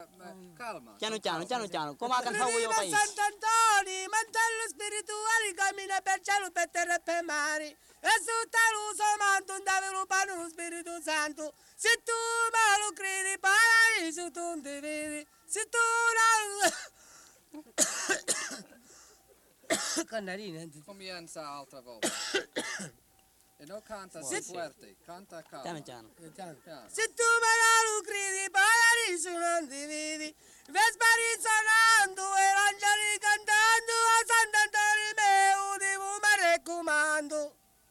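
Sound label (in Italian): canto religioso; santantonio; Cardeto; Mammola; Cinquefrondi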